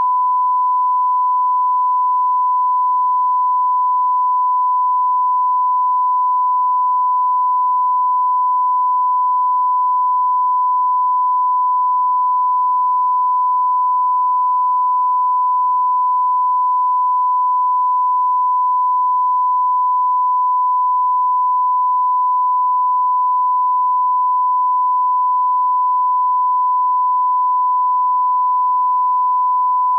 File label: 1khz test tone -12dbfs; 48khz 32bit float